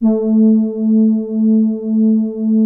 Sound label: soft brass A3; SOFTTRIX; Music Store - Claudius Diemeter Schwerlos